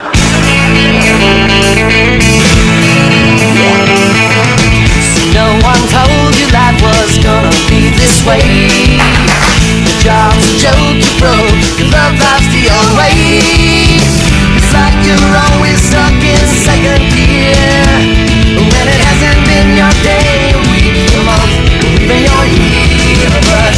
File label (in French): sonnerie; courte